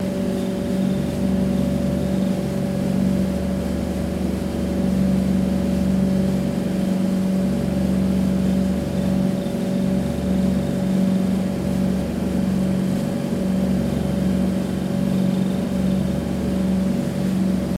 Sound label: Boat Inboard Cruisin, motorboats